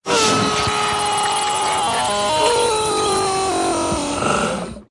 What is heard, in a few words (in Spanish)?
grito; neo sonidos electronicos